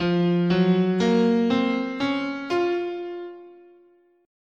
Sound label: F Japanese, scale